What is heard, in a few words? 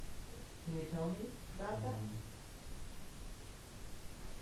moan